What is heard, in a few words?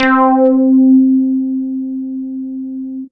Synths Note C